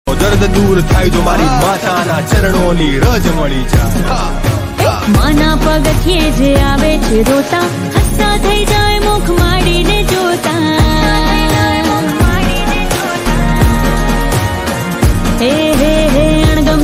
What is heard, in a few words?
gujarati ringtone